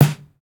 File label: snare, snare house